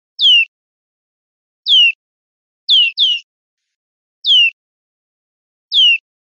golpes sueltos yio, verderon

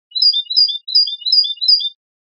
fantasia culili

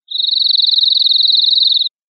amillerado, verderon

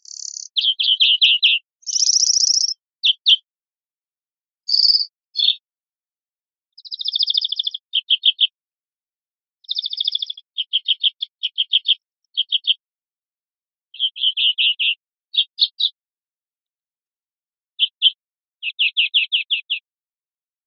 faltas gorrionada; verderon

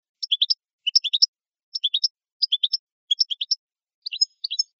toques tibali, campero

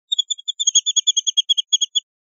rep tiriteos, pardillo

